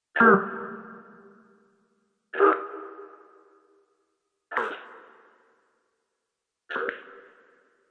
drop fx, sweeps and fx; Ze Franks Pain Pack

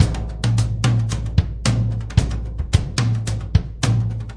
110 swinging rolling toms

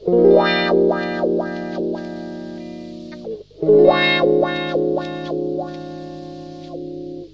wah wah 7ths, guitars

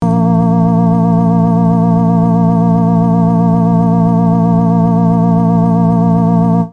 dark house note, Organs

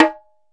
Roland SPD-20 perc tabla

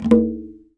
arabic perc, Arabic Percussion Kit; ETHNIC and WORLD PERCUSSION; Arabic Percussion Kit 3

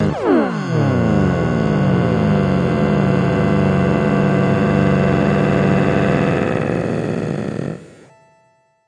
fifth stretched fx, sweeps and fx; Ze Franks Pain Pack

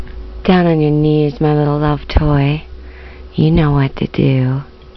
down on your knees, Silly Lo-Fi Orgasms

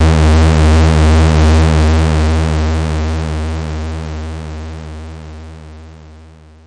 bass D sharp 2; multisampled; must destroy you bass 2

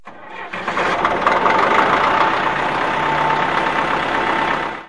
bulldozer engine; Sound FX Real Life

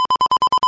extra, video games; solarq

